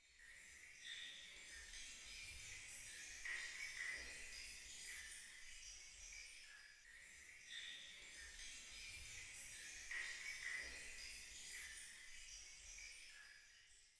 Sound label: water